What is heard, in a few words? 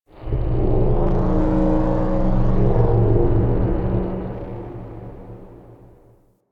Low Growl; Warframe; The New War; Zariman; Apartment